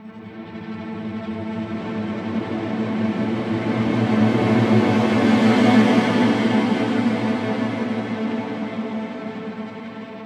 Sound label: Cello Ens cresc, crescendo